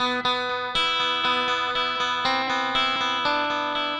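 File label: Slow Guitar Arpeggio; Guitars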